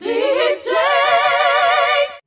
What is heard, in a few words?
dee jay SUNG